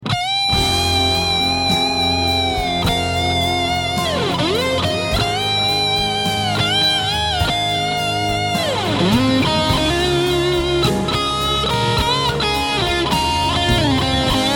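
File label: Solo, guitar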